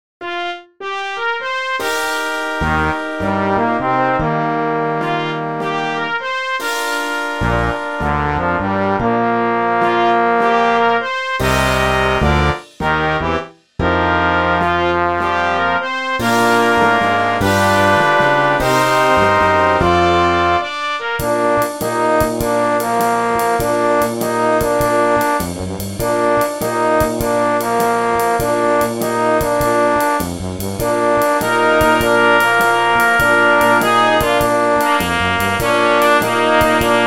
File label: Rhythmn Blues